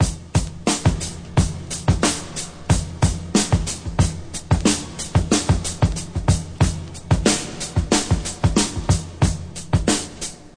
91 bpm breakbeat sample f key, Free breakbeat sample - kick tuned to the F note.